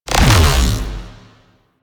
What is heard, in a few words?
Crp Space Fighter Support Drone Shield